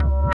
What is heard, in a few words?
EQ Perc, Percussion Mix